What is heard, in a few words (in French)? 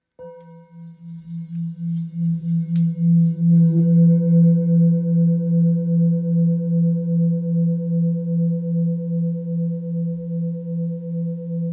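Bol thick mi, bols chantants tibétains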